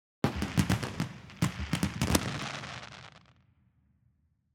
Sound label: Fireworks Sound Effect; iOS Sounds